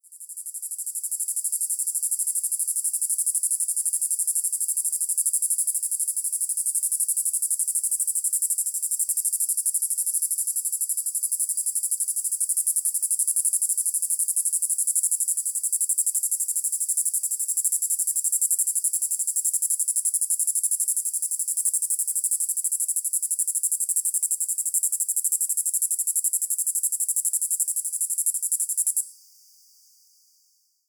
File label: Diceroprocta vitripennis, cicadas songs; US; East of the 100th Meridian